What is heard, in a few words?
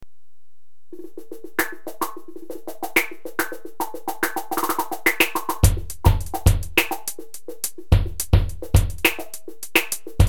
Drums